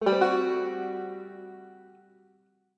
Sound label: banjo chord; Summer Catchers